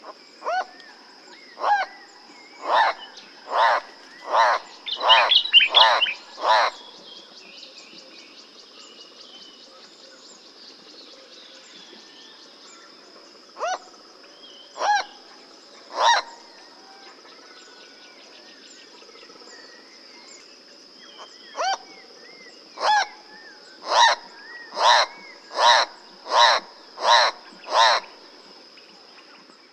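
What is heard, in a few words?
turako schalowi, Schalow's turaco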